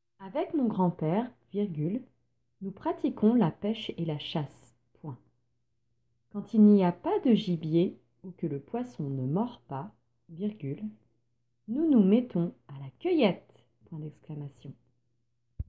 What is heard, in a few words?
Vocal